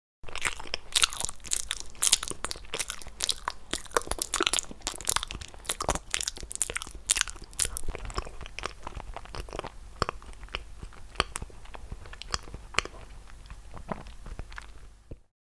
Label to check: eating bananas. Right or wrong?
Right